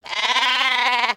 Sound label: Sheep Baah; creatures organic